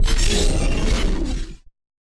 Battered Synth; malfunctioning synth samples

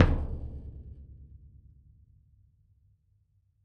The Big Island Drum; Sonic Foundry (Sony Creative Software); Joe Vitale Latin Percussion

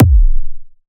Phase Plant, kick

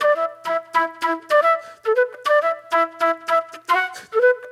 Fusion Flute

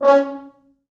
4 FrHorns

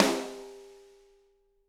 SD, Drums; Bob Clearmountain Drums I

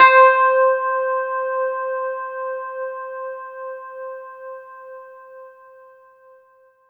C5, synth; 90 s Sample CDs; NiceBeats; SynthLine VOL-23

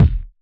hip hop kick

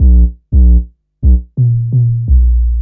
Raw Bass, Drum & Bass; Fluid Dynamics

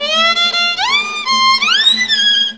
VIOLIN LINES; Best Service; World Colours

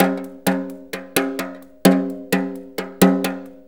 Brush Perc, Perc Loops; Drum Tools

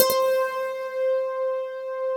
harp C4; PETERHARP; GABHARP; Synth

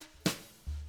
BRUSH LOOPS, Living Drums; Sampleheads; Peter Erskine

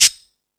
Percussion, Drum Machines

